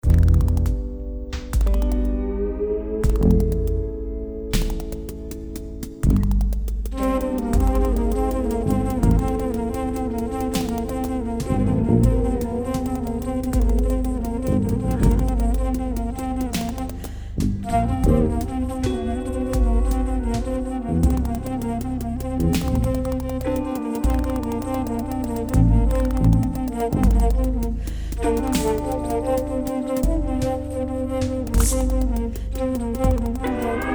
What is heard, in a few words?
Very Slow Disco